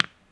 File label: domino; Crazy Machines; Играет вся семья